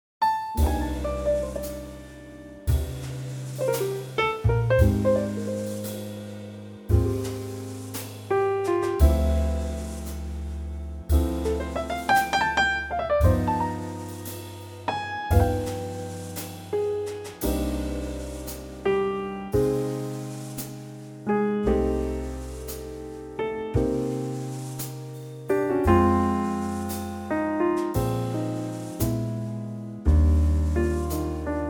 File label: Cm trio; key - Cm - vocal range - C to D; Gorgeous laidback Trio arrangement